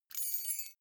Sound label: key ring large fly tinkle; objekte; schluessel